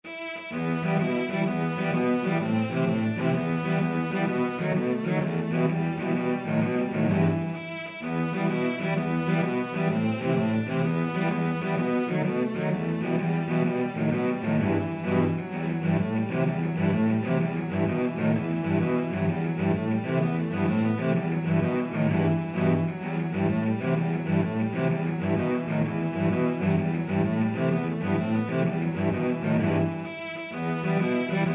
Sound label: cello quartet